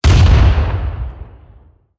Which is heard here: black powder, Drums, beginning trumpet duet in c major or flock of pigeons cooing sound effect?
black powder